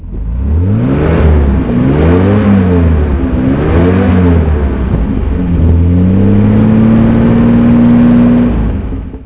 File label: mgc